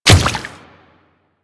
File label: Water Step, Footsteps; Royal Guard